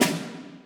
orch snare

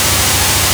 whitenoise, zarazaranoise; 主播女孩重度依赖

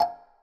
synth

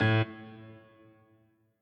piano riff intro

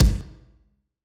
Kick; 孤独摇滚

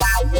wobble

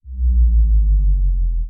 bass

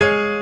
piano fx

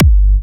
Kick

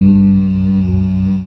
bass raw

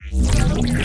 bass purge

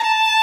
violin

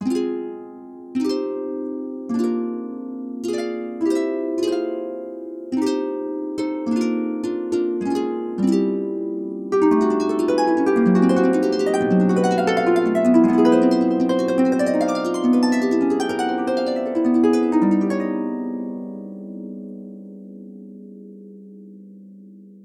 harp bgm

